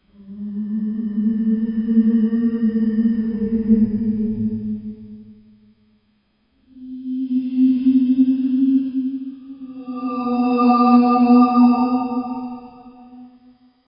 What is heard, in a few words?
a dark place a boy, dream